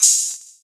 soft open hat sound a sharp key, open high-hat sound tuned to the A# note